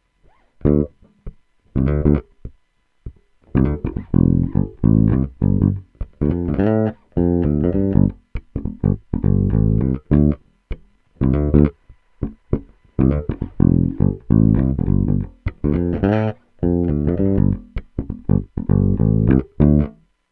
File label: Bridge Fingerstyle Tone UP; Peavey based pickup Shootout